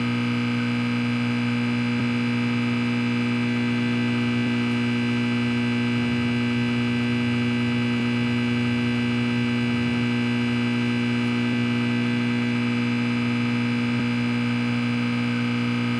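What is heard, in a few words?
electric ambient; ambience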